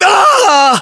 death, voiceovers; ModernWarfare; localized english

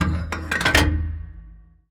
gun reload; World of Tanks

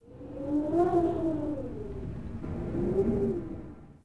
Tundra Wind Gust; Ambient; Civ4 warlords

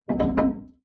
damage vehicle; World Of Warships

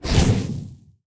depth bomb; World Of Warships

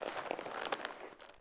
Leather Creak Long; Civ4 warlords

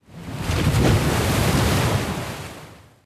submarines engines; World Of Warships

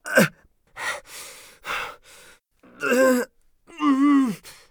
agony; Escape from Tarkov; scav3

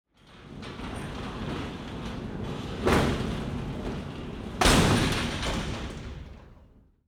hangar layer

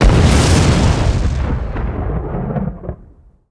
damage vehicle; World Of Warships

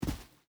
footstep boots sand jump land; Rust

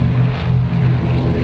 ambient port; World Of Warships; port3d

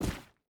footstep boots stones jump land; Rust

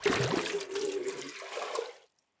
waterbucket fill submerge; Rust

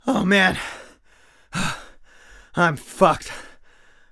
hurt neardeath; Escape from Tarkov

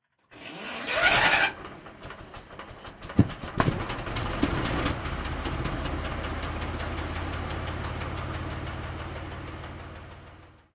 exterior engine start, tanks; War Thunder

